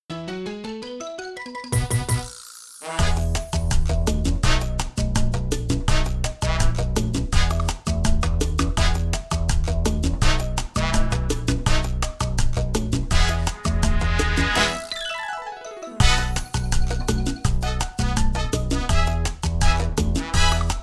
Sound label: Jingle INSTRUMENTAL; Chiquita